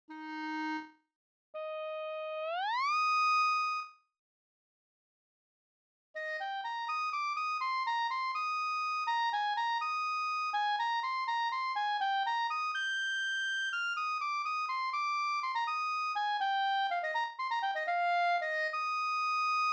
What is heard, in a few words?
Clarinet Eb; PARAFRASI ON BACH THEME; A. Sarcina